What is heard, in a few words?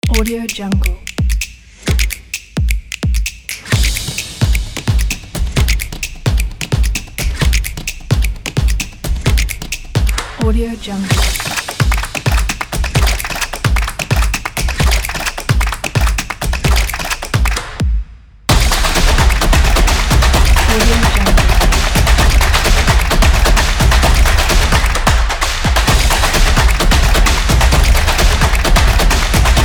a drum beat